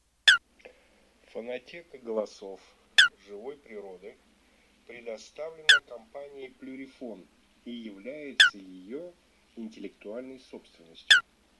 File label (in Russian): Лысуха Folaga; plurifon